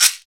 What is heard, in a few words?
Caxixi, Percussion, Shakers; Roland BK-5